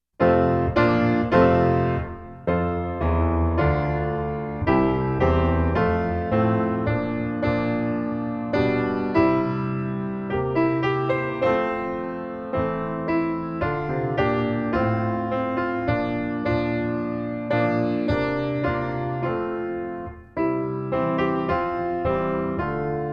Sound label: simple gift base, tromba trombone